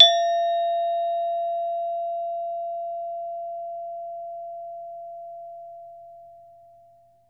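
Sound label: SARAN F4, Metalophones, Pitched Percussion; E-MU Producer Series Vol. 7 – Old World Instruments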